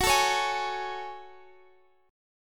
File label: F sharp Minor Add 9th F sharp; strummed